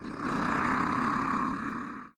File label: mobs dirt monster; server-nalc